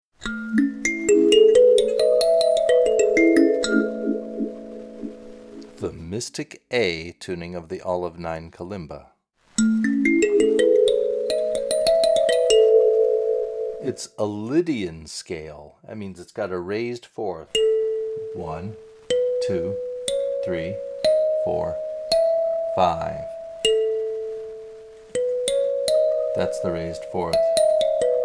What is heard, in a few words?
Olive Tuning, Olive-9 Kalimba in Desert Olive Tuning; Magadi Olive-9 Kalimba